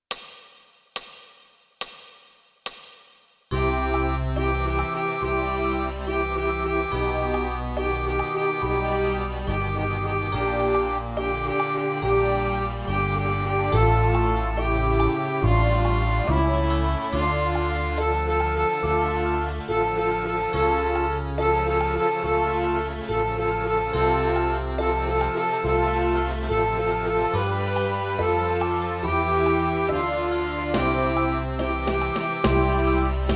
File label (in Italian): Fisa tutti